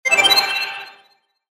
回復魔法 小

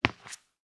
スリッパ 一歩; 足音の効果音

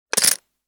点棒を取り出す; 場面切り替えや心理描写; 演出効果音

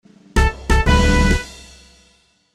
ファンファーレ; 動畫和制作視頻的音效

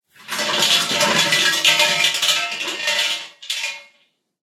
本坪鈴

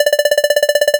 会話; nes retro talk sound effects

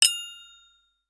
金属がぶつかる音・スプーン

